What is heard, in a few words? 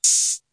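open hi-hat 808; Hats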